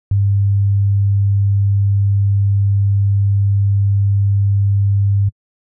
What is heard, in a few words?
Sinus 100Hz